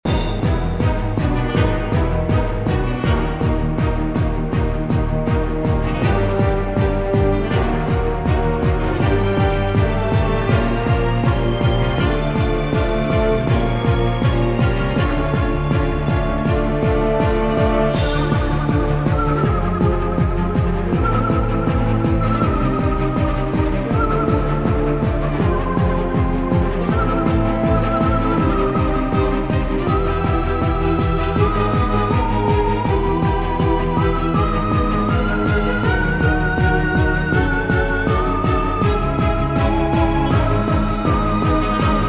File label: Apocalypse Videogame Background LOOP; Sound Tricks